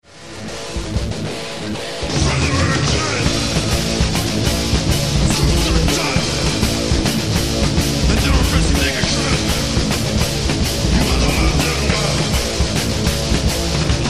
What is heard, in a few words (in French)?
basse chant